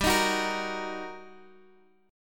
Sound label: G Minor Major 7th Sharp 5th G, Guitar, Drop D; chordshape; down